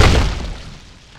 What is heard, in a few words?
attack; tiny